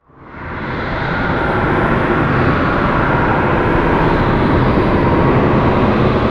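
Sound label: ice vortex loop; ice tower pack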